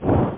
shoot; DogFight!

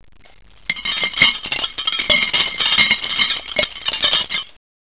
bottles

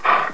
horse

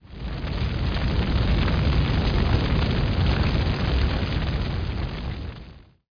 fire; zeusdemo; Revista CD Expert 45

